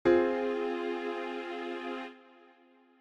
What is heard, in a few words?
Fm 2nd Inversion